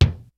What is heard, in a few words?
JAZZ KIT; DRUMS; Kid Nepro; Elite